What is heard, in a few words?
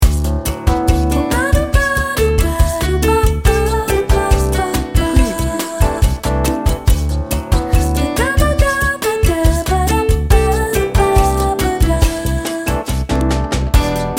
lite pop jazz